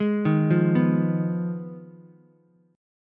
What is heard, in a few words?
B 6th Flat 5th B down Ukulele; arpeggiated